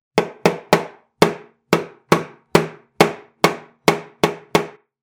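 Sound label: plastic sound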